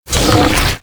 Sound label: Flying Mouth Attack Inhale End; Enemies; Warframe; ManInTheWall